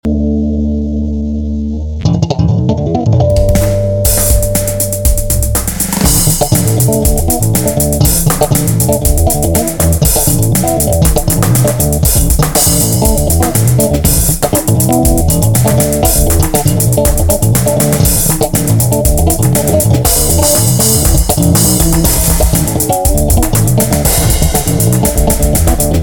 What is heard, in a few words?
Slap & Tap